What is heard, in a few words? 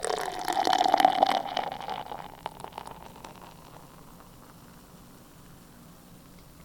Pouring Sound